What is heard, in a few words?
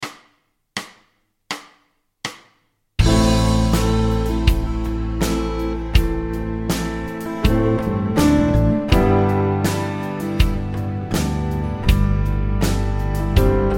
backing long road